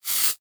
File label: razor shave